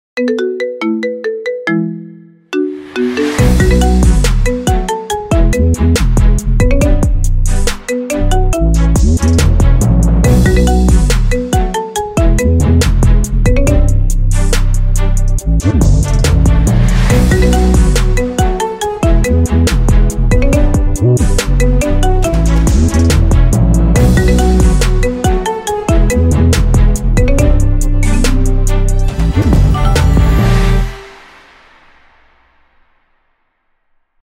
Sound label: Marimba